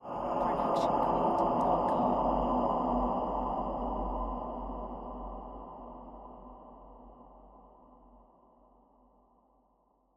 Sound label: Ominous Magic Drone; SoundsCrate